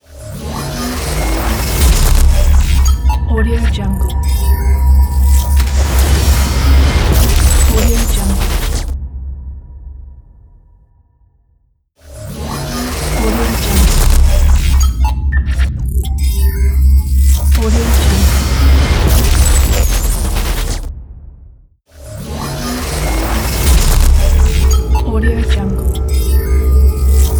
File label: high tech glitch logo